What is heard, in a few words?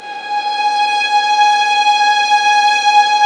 STR VLS, Roland String Master Series, Vlns 6 p-mf